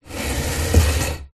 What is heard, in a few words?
household chair dining slide tiled floor